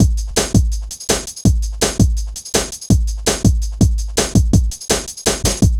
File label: Ezee Rolla, Drum Loops; Zero-G Jungle Warfare 1, 2 & 3